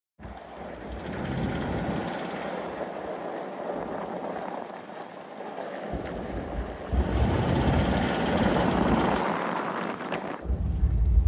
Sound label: very odd noise